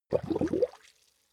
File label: swamp oneshot bubble